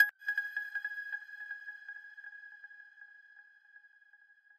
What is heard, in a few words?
arp